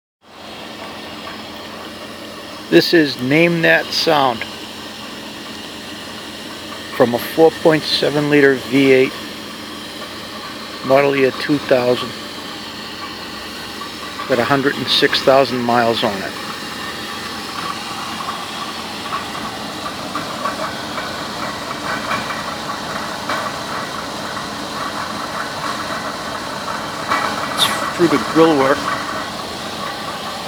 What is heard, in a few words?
noise idling front